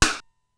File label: Simmons SDS-9 RM